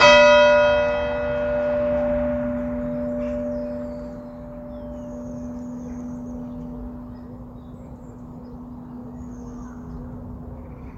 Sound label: six, bells; copthorne